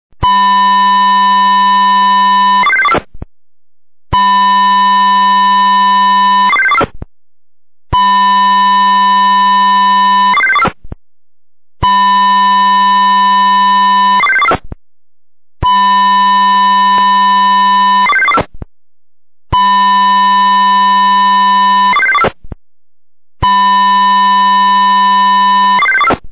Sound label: signal